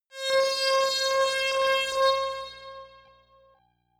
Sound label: synth bass smooth short